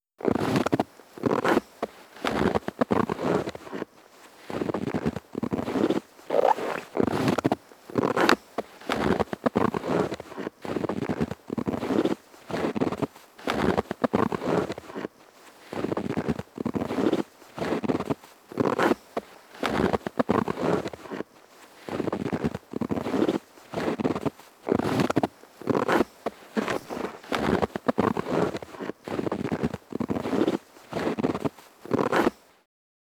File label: steps snow slow hea, foley effects; Asian Show Sound Effects